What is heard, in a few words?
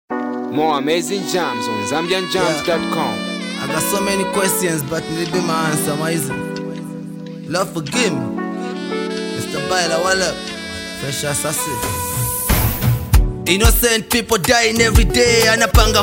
Rock Defi Beat